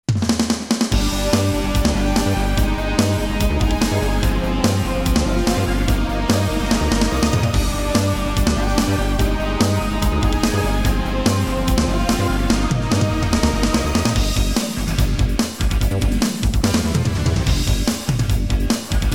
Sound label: The Great Bell is Ringing; Ys Heaven's Sanctuary 3; JDK Band Edition